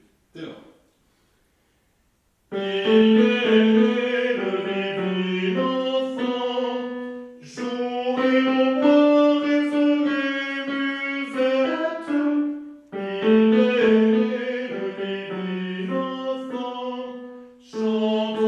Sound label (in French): Il est né le divin enfant Tenors